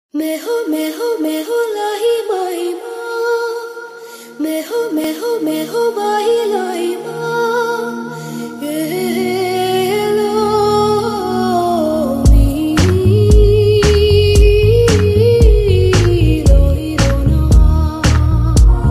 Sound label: song